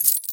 gold up; skyrim

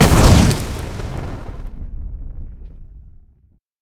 firebolt; skyrim

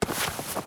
cloth up; skyrim

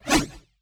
blade 1 hand bound swing

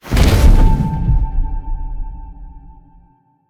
shout ethereal

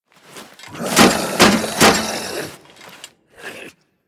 draugr combat idle shield rally; npc

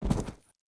bear foot back; npc

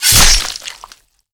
kill stab in

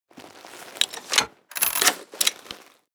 crossbow reload

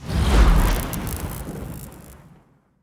enchantment shock; weapon enchant

